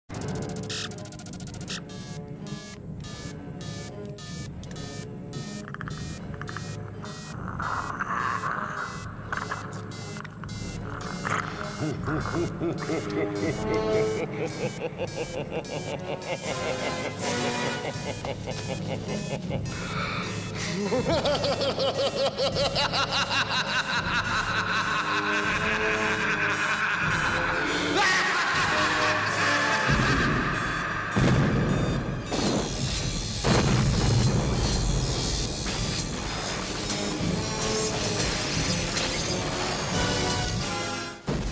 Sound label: Explosion; Predator End Explosion Scene